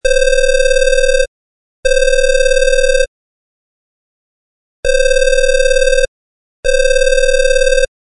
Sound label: phone ringing